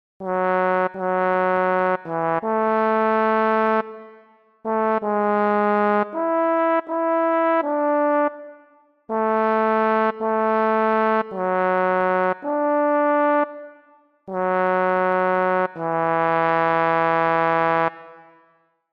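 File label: Baritone, Barbershop Tags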